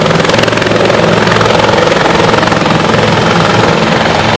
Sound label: ah1z engine, Aircraft; Vehicles